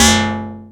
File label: Metal; free techno acid samples